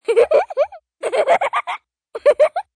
Laugh Kid Tickled, Laugh, child, girl, delighted giggle (human voice)